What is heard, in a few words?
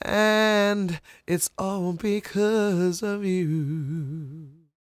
croon, Vocal Planet Blues Soul; Spectrasonics